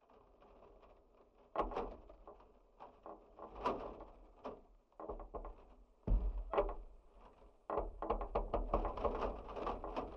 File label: church door and window; jez riley french